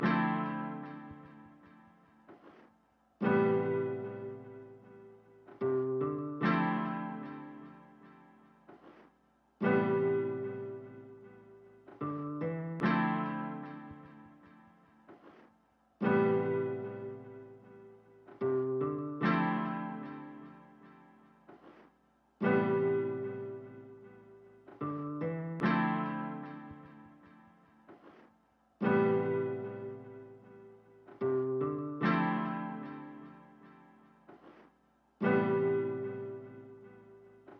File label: 150 BPM A Min Guitar; Loop Stems; Cymatics; Mixed Feelings